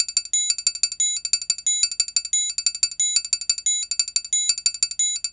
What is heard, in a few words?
Triangle Loop; Sonic Foundry (Sony Creative Software) - Fluid Dynamics Drum & Bass; Construction Kit; Percussion